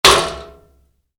Wood hit aluminium heavy, Wood, Impact: Aluminum, Heavy; Materials; Stuart Duffield